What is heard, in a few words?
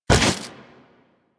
Jump; Warframe; Generic Foley